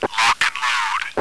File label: flag score